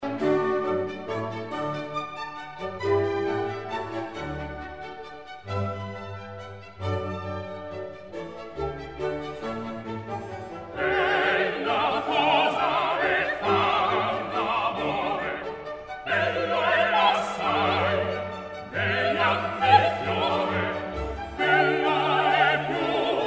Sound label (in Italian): Coro; Bella cosa e far l'amore; Mozart; La Finta Semplice